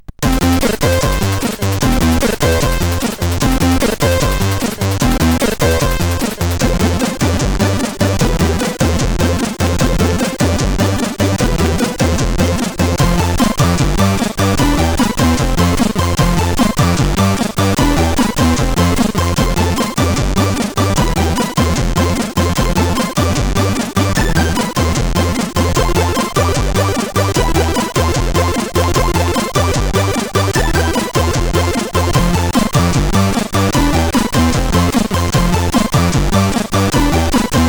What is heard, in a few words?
tune, CSG8580R5; Tripler tune 3